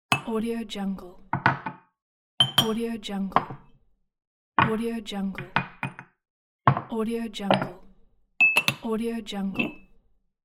putting down glass on table

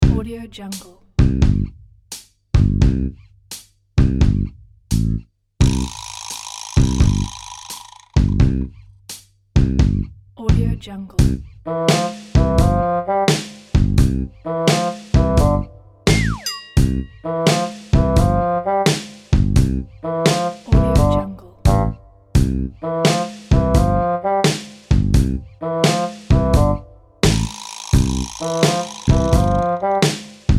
silly slow walking elephant; imakemusic

